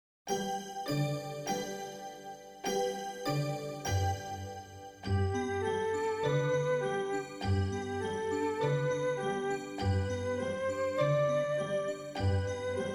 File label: instrumental